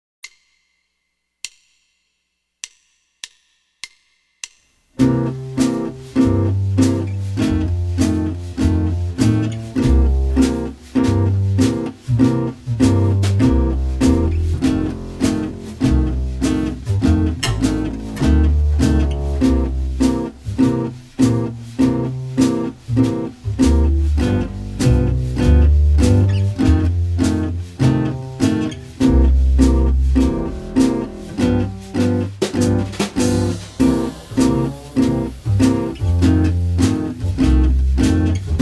12 Bar Blues In Other Keys C Root 5, jazz guitar

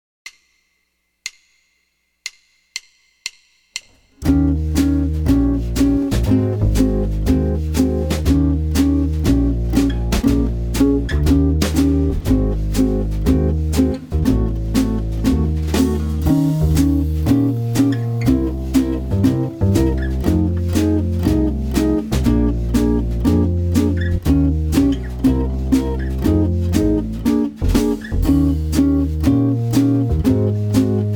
Jazz Blues Eb Major Root 6, jazz guitar

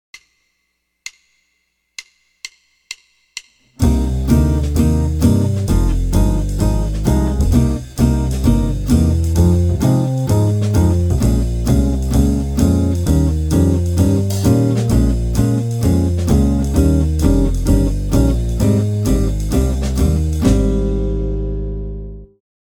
Repeat Signs A Quick Tune, jazz guitar

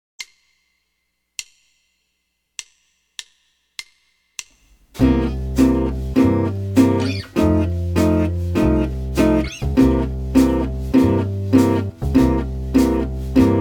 12 Bar Blues String 5 Chords, jazz guitar

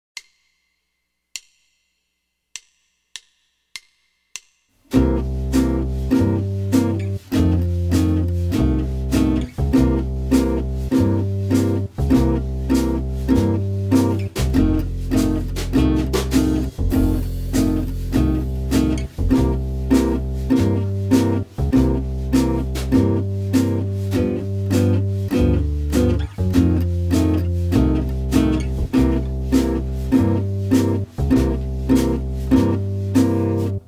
Mix and Match Root 5, jazz guitar

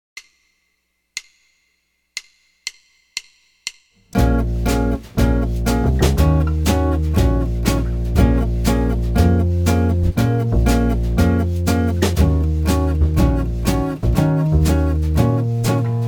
Minor Blues Bb Minor Root 5, jazz guitar

